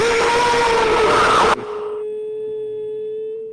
crt tv roars

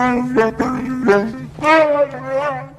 chewbacca sad; blyat mellstroy

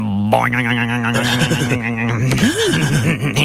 boing; beavis

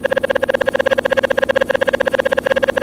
scary dog; mf universe sounds

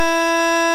wii error

